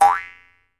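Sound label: Jaw Harps, Pitched Percussion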